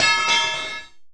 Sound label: Digging Bar Drop